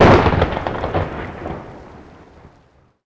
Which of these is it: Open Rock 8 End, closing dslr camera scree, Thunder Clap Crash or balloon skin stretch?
Thunder Clap Crash